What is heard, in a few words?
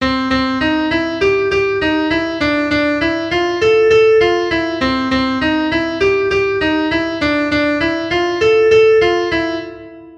Pattern C to Dm